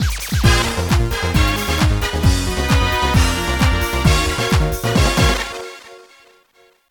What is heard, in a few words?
VS Win; Street Fighter III 2nd Impact